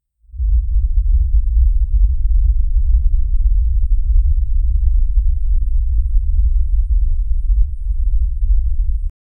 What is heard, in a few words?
power up aura with calm, deep breath